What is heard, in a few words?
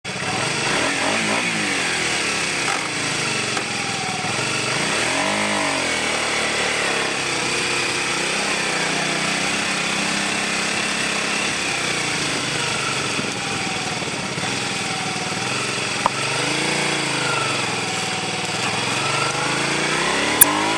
VTR250